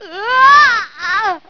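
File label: Fall Quicksand, gameplay sounds; Link falling into quicksand; zelda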